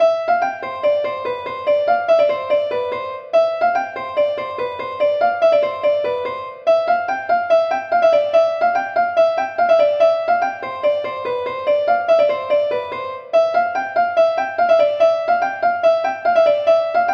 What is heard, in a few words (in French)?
suite plin